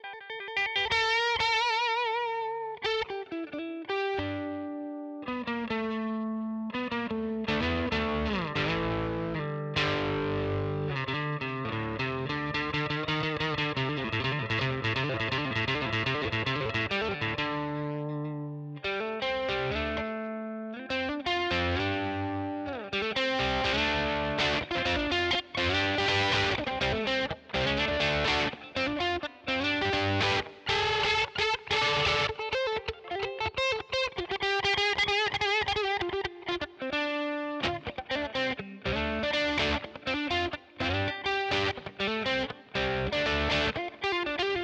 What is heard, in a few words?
Simplifier DLX Parallel American Lead 6L6 Combo